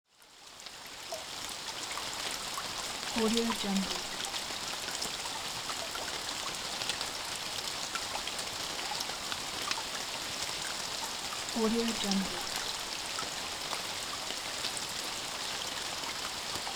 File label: rain